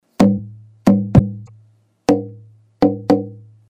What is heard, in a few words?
Redwood Body